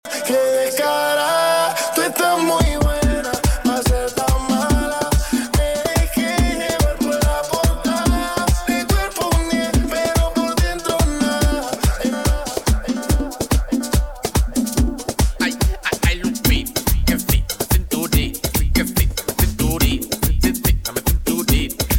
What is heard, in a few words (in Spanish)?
merengue flow mix